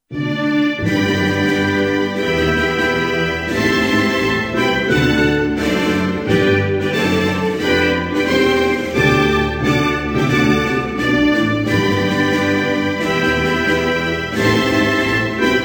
Nepal, Hymnes nationaux

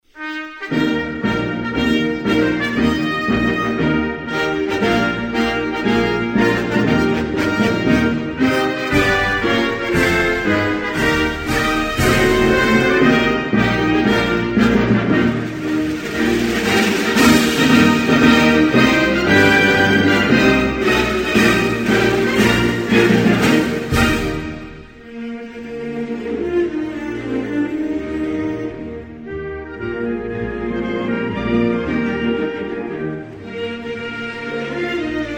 Mexique, Hymnes nationaux

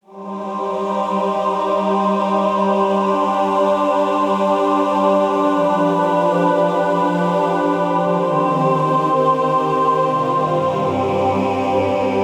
Spirituel Jingle; Jingles medley

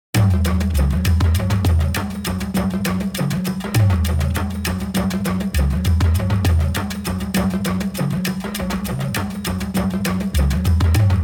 Afrique tribale jingle

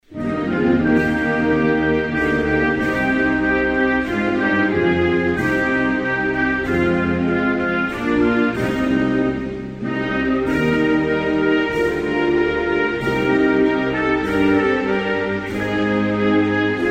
Inde, Hymnes nationaux

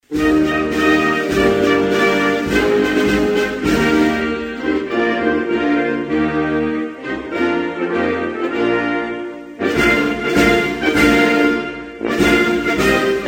Senegal, Hymnes nationaux

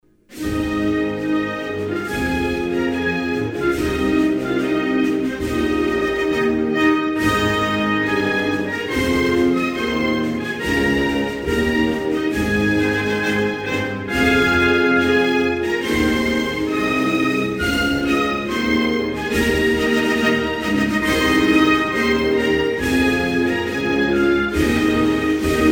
Mongolie, Hymnes nationaux

